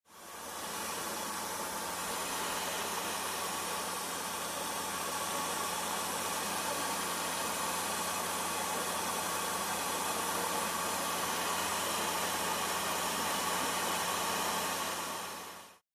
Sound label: Radiator Hiss, steam blasts